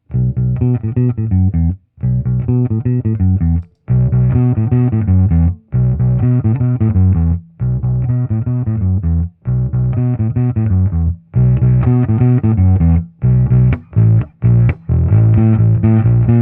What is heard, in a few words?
not touch sensitive, bass; Wah Huge Pork Loin touch sensitivity test; Lakland Duck Dunn P-bass with Lindy Fralins, GHS Pressurewound, finger style, Pork Loin, SVT-CL w/Peavey 15" BW recording by Heil PR-40.